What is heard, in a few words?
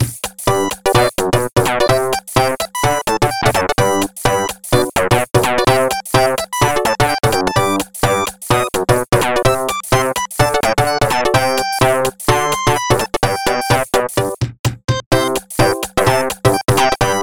Instrumental Blip Mix; Groundhog Day